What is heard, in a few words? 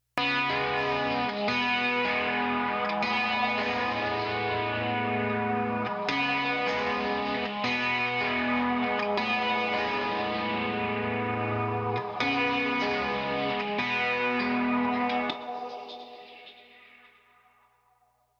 guitar with singularity